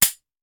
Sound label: Hand Gun Foley; Sound Ideas; Guns